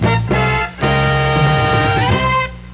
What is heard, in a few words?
win